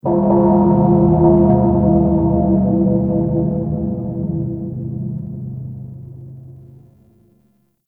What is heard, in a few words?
Primordial Bell; Ambience; MITW; Warframe; Entrati